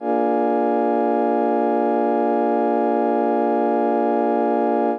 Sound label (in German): akkord b7 b1 hoch